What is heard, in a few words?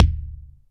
Kick, Akai MPC500; Toolkits